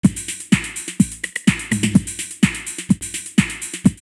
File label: Tekno Rhumba 126, drumloops, Rave; X-Static Goldmine; 90 s Sample CDs